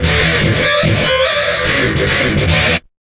GTR, Chemical Beats

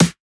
Snare, Dance Drum Kit; Drum Oneshots; Twilight; Monosounds

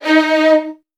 D#1, Strings, VN 16TH; ILIO; Kirk Hunter

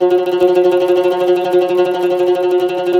CUMBUS Tremolo

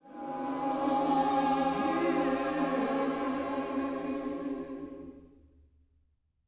SPIRIT END, Gospel; Spectrasonics; Vocal Planet; SPIRIT SNG

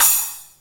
HI SPLASH, CYMBALS; Back In Time Records - Big Bang

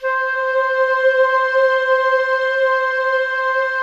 STR, Orchestral Strings

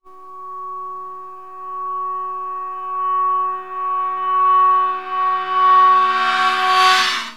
REVERSED BOW, BOWED CYMBALS; Zero-G Ambient 1

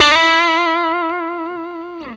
BLU TRILL, Guitar & Bass; 90 s Sample CDs; Quantum Leap - Guitar & Bass

